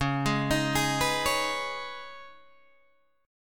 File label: C sharp 7th Suspended 2nd, C sharp, down, Guitar, DADGAD